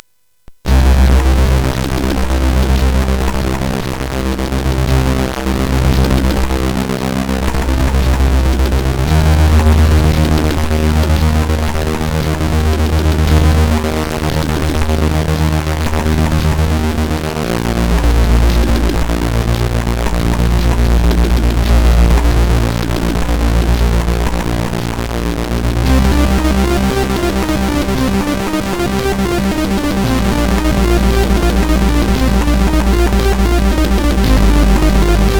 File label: Drummer; CSG8580R5